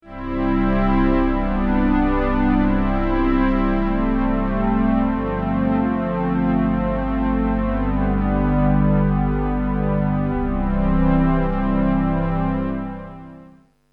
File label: memorymoog string